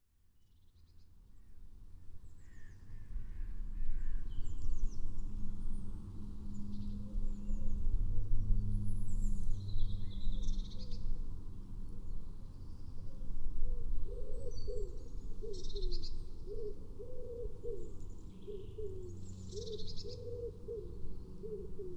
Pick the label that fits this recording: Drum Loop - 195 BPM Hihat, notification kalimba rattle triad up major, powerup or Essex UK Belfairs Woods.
Essex UK Belfairs Woods